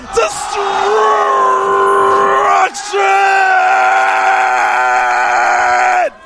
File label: destruction